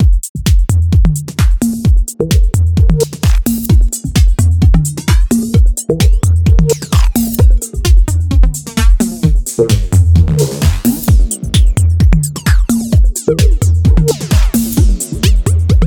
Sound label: Flanger; MASCHINE STUDIO